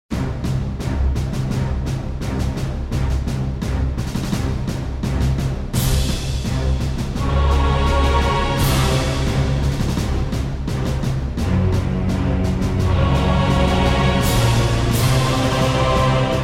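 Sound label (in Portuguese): mega funk mix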